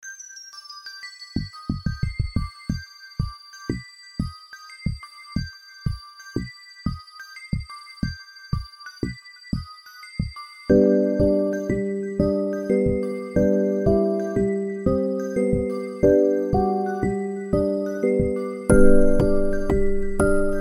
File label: Musicbox Dream